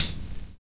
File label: NOISY TRE; Univers Sons